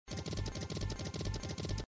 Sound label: slot Baraban